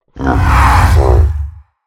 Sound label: ravager roar